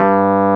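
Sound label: WURLY NO, Wurlitzer electric piano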